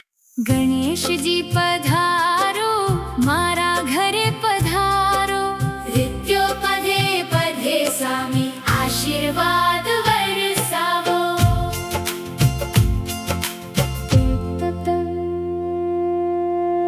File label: gujarati ganeshji padharo, ringtone